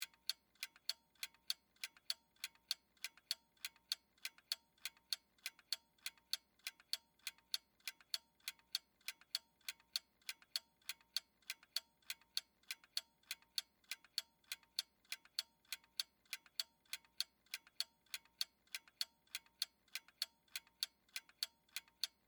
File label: Wall Clock Tic Toc, Technology, Wall, Clock, Ticking, Tic, Toc, Loop; Video Games